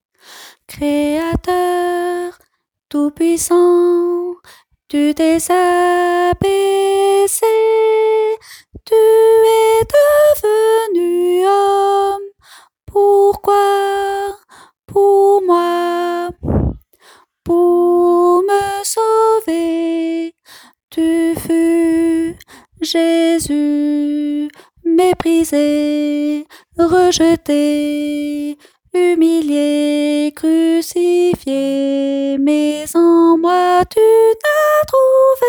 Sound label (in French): soprano; chant 167